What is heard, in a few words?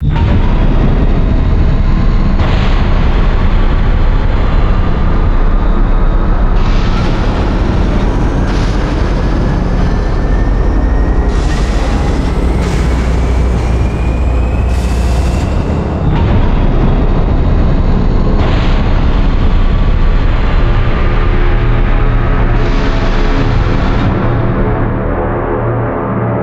citadel; city8